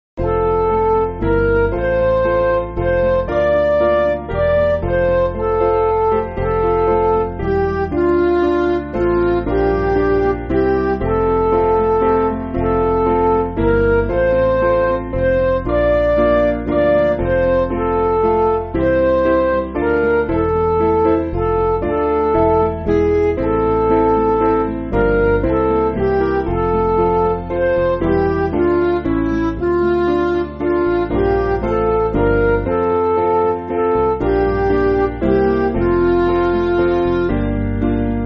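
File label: Midi, Music Backing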